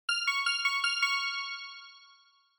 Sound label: Quiz Ding Dong Slow Long